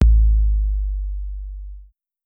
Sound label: Kick Sub; One Shots; Drums; Shine